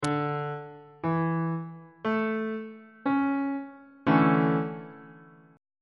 e double flat major 7th suspended 2nd chord on bass clef